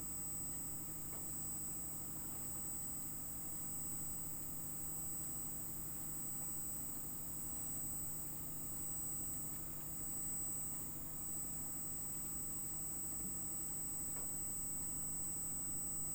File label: Hydrophone